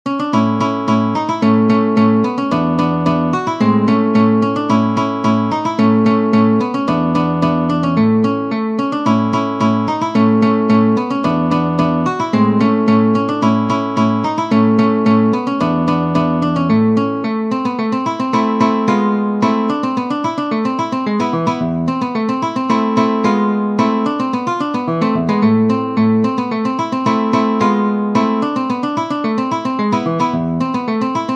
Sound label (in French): Allegretto Grazioso, guitare; Ferdinando Carulli; Op. 241 Méthode pour la guitare